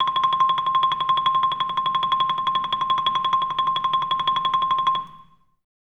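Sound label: TREMOLO C3, Orchestra; Best Service; Peter Siedlaczek's Advanced Orchestra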